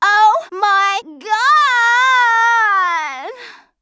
knock; True Crime Streets Of LA; XBOX

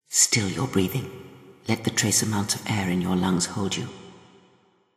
breath, voice; Star Wars Knights Of The Old Republic II; XBOX

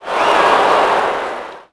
NEG Reaction,Crowd; Cars; XBOX

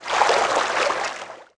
Swim; Player; Footsteps

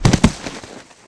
foley slide, skater; Tony Hawk's Project 8; XBOX

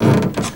spring, sled; WhiteOut

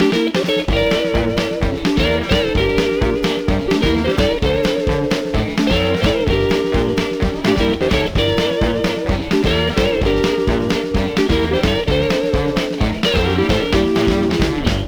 flame puzzle song loop; Voodoo Vince; XBOX

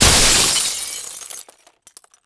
glass

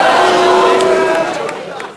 boo; WhiteOut; XBOX; tundra

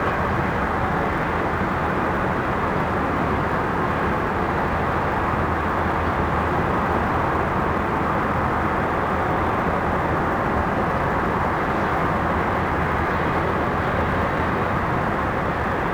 Paris day, Ambience; Project Gotham Racing 2

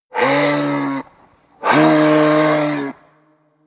Moose Vocal; Animals; XBOX; BassProShopsTrophyHunter2007

